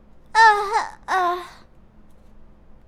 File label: Death, human girl villager; customnpcs